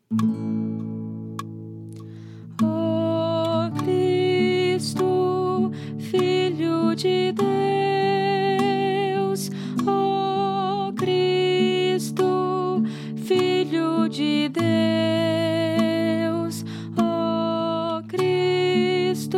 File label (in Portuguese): Soprano